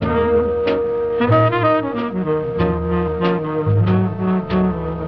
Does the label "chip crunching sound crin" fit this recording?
No